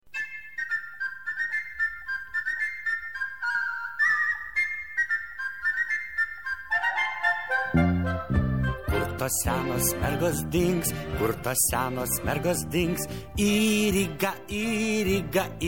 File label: HandBell sound track